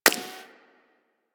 Bullet Impact Ricochet; Warframe; Weapons

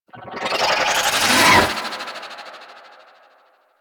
Tear In Space Cast; Warframe; Magician

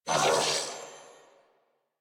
Death Grineer Male; New War; Narmer

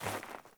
Sandals Walk Snow; Characters; Player

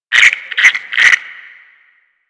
Garden Animal; Warframe; Ambience; Clan Dojo; Dojo Garden Animals

